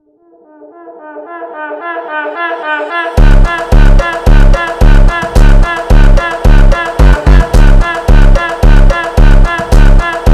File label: funk slowed